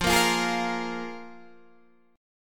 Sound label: F Minor Double Flat 5th, Guitar, DADGAD